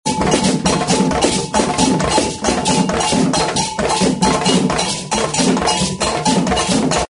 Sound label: african celebration rhythm